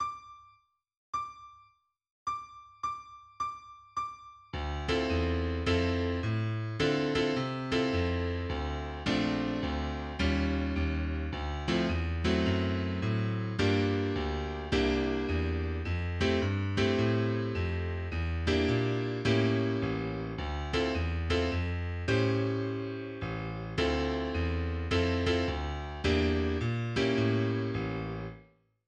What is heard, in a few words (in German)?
Playback jazzig; Christ ist erstanden